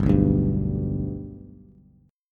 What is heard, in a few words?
G Major G down Bass; G strummed; JGuitar